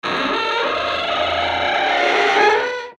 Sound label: door squeal